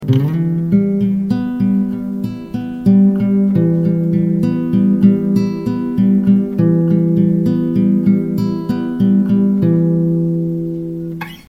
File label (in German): Gitarre; HDR-7